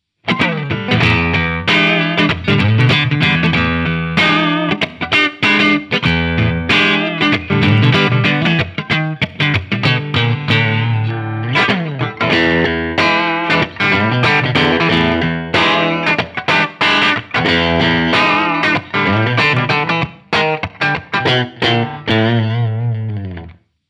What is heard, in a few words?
Sound City Master One Hundred Single Coil Original